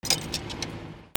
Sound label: PHONES